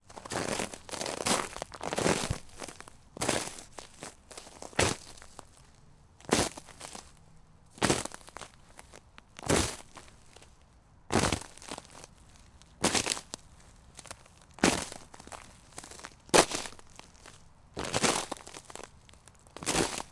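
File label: sounds of footsteps on ice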